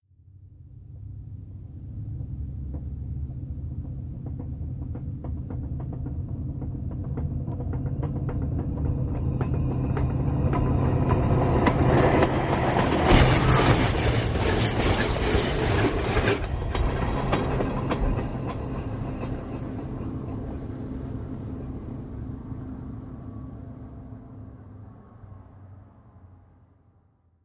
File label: Train By Very Close, diesel